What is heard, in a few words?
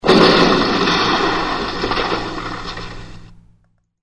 burst